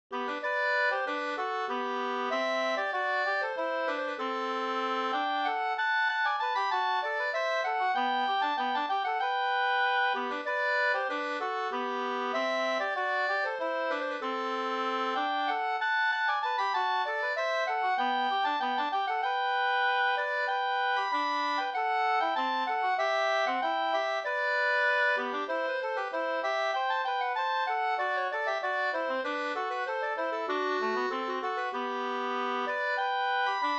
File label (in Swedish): skanklat; Tabergs brannvinslat; Rattvik